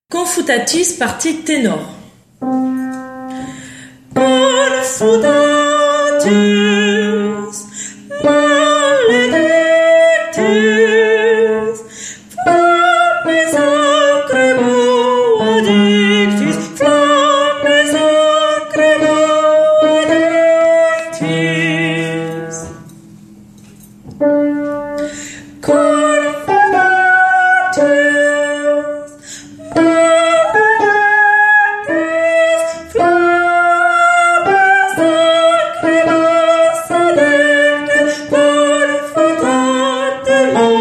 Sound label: Requiem Confutatis Tenor; Mozart